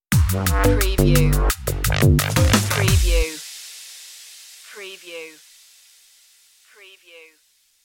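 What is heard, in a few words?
danger drum and bass stinger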